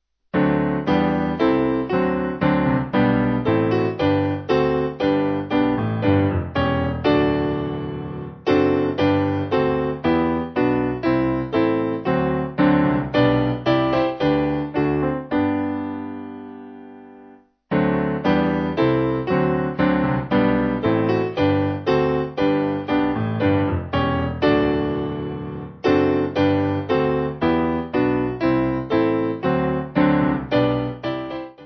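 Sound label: Piano, Music Backing Files for Churches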